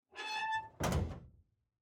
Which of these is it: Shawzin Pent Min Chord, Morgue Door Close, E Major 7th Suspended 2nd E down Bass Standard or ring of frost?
Morgue Door Close